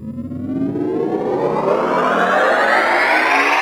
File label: sfx sci fi; Masterbits - Switch FX